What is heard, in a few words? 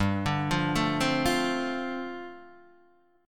G 9th Suspended 4th, Guitar Open D